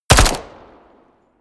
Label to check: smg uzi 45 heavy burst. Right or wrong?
Right